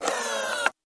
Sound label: Door Outer, Open, Damper Mechanism; dat machine